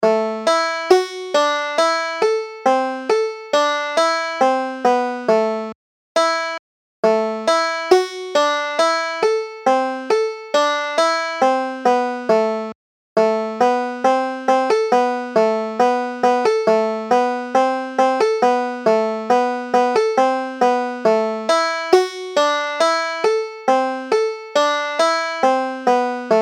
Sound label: banjo